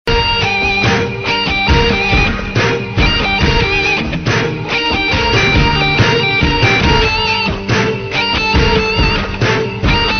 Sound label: GUITAR RINGTONE; LORD OF THE RING